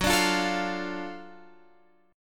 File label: Gb Minor Major 7th Sharp 5th Gb, down, Guitar, Open F; strummed; JGuitar